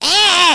screaming; Furby; Hacked Hot Wired and Channeling Cartman

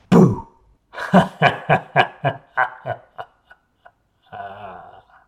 boo laugh; Interactive Jack o lantern

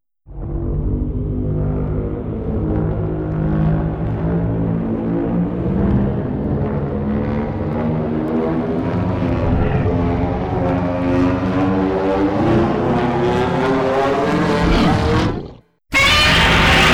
Godzilla fire breath and roar; Night Light

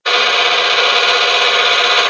Flare Sound

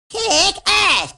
kick; Hacked Hot Wired and Channeling Cartman

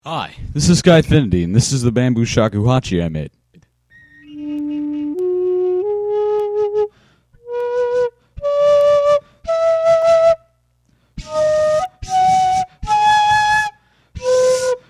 Shakuhachi

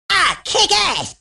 kick; Hacked Hot Wired and Channeling Cartman

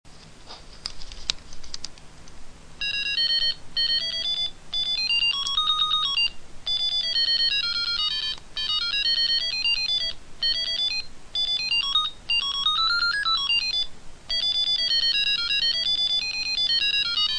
Fast Blues

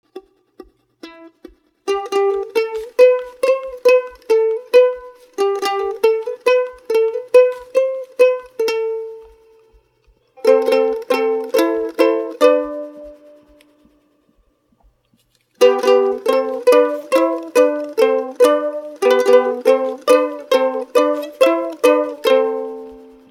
Ukulele Test

